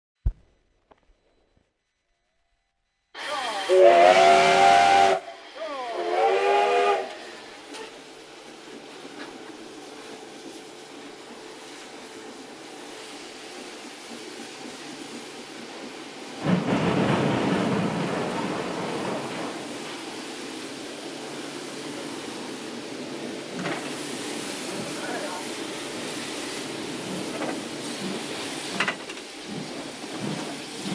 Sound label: JNR Class C62 locomotive sounds; 1971, Vinyl; shoji matsuzawa